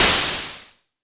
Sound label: Arturia MiniBrute noiz